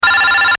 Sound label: Ringer; Blackberry Bold